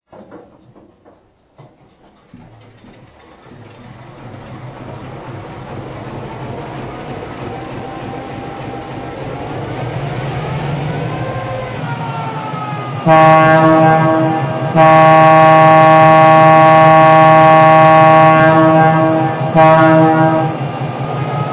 SW-1, trains

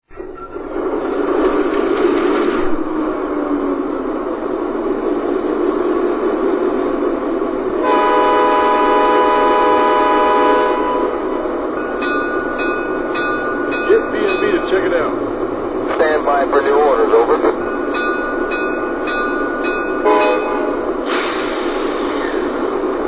Train Master, trains

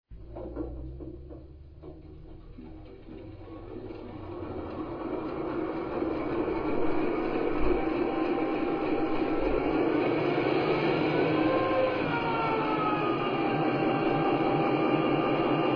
GP7

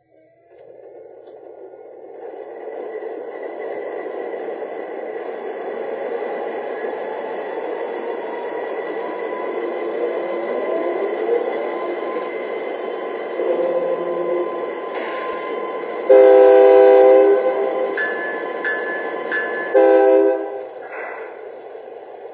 ac4400cw, trains; CP

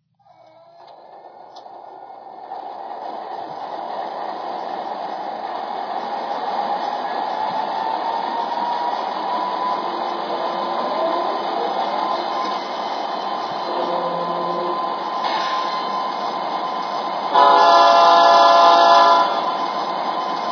ES44, trains

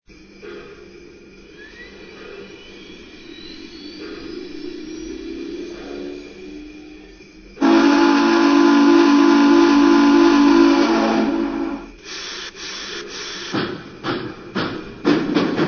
GS-4 484; 4457

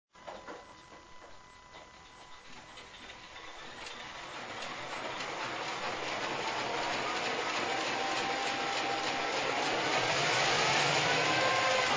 GP-7

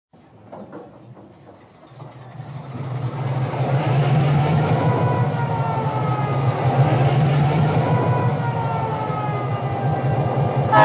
EMD E-8, trains

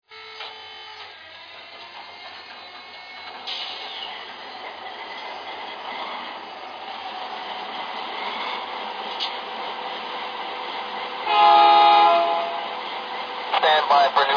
dash9; BNSF

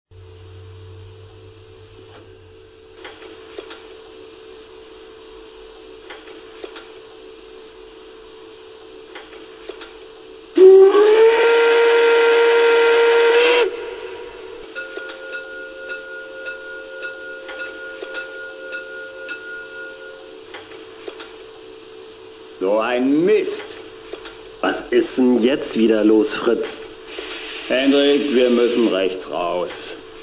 BR44, trains

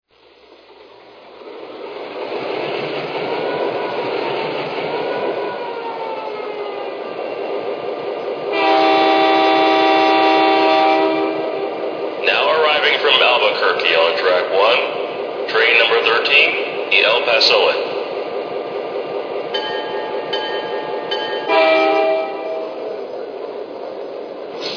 E8

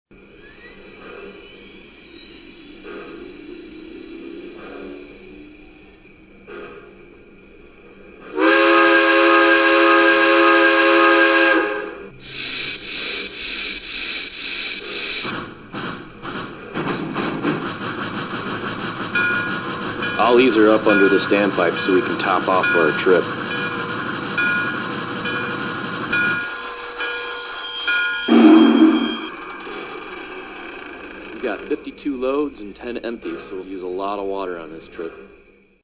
Y3

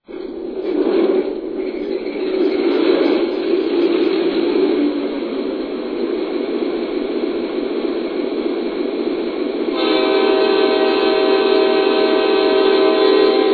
F59PHI, trains

